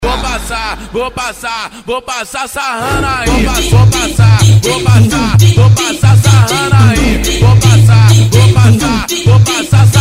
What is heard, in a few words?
funk bass; impacto som